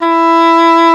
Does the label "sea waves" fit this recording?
No